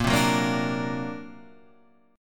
D Augmented 7th A sharp, guitar

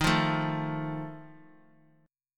D sharp Suspended 2nd Flat 5th, chord

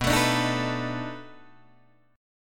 B 7th Sharp 9th, down, Guitar, Open D

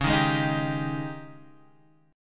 G sharp Major 7th Suspended 4th, C sharp

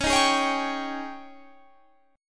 G sharp Major 7th Suspended 4th, C sharp

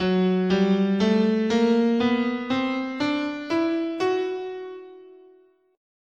F sharp Eight tone Spanish, scale

